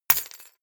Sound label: key ring large drop parquet hard; objekte; schluessel